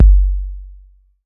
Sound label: BD 808